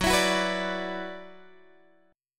F sharp 7th Suspended 4th Sharp 5th, Guitar Open G; x,11,14,11,12,12